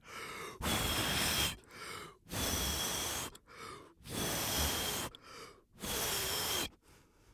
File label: blowing balloon